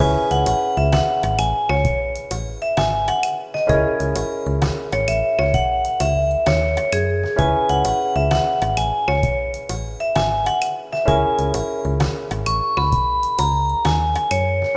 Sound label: Detective Game Theme Loop